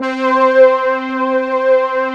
ELG STR C4, ELE STR

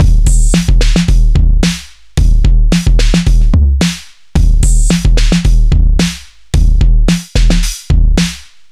junk hip hop 110bpm

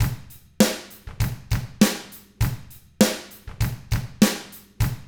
ROCK, Drums; ILIO; Double Platinum Drums 1

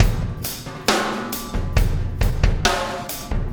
Impact Crater Beat, Processed Drumkits

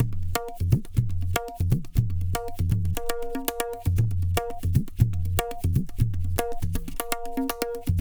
Tabla, 120 BPM; Zero-G; Pure Tabla

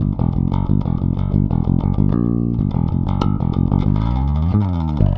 Rock, Bass; Bass X

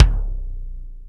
BD, Bassdrums, Roland TD-20

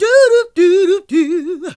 GOSP. Singin

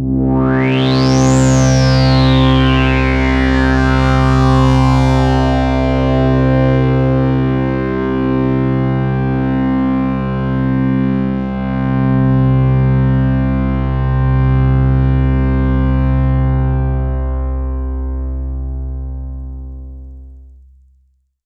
pad C2, 5U Modular

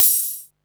hat, Techno 138 BPM

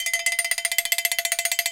COW, Cowbells; Roland L-CD701; PRC Latin 1

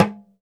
Talking Drum; E-MU Producer Series Vol. 7 – Old World Instruments; Drums&Percussion

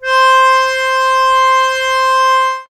ACCORD C4, Accordions; USB Soundscan vol.40 - Complete Accordions; AKAI

